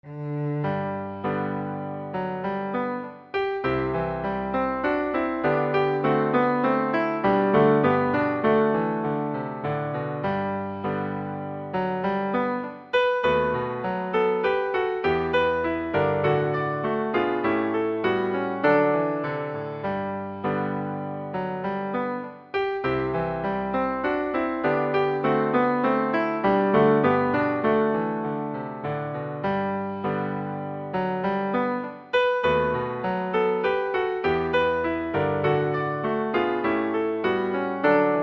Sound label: cello